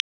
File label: click